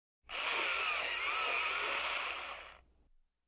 Im Buero Elektrischer Bleistiftspitzer

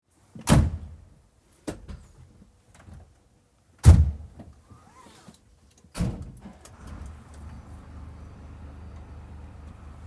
Einsteigen Anschnallen

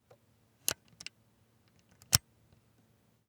Schnellkochtopf Verschluss

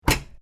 Kuehlschrank Kuehlfach oeffnen

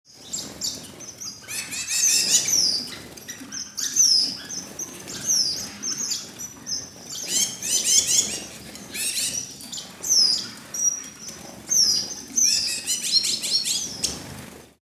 Voegel

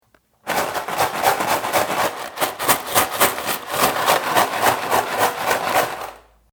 Nudelpackung schuetteln

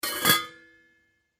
Deckel

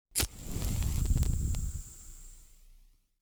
Zuendung gemischt

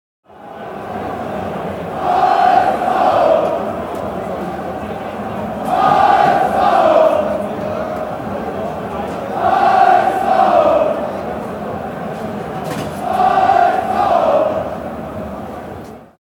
Stadion Fans und Gesaenge

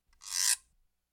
Suedsee Guiro

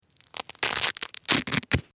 kleidung klettverschl

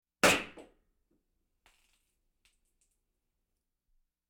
Bei Tisch Knallender Korken

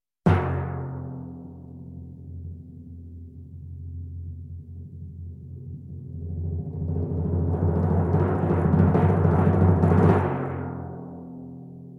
Konzertstimmungen Paukenwirbel Mit Anschwellendem Ton

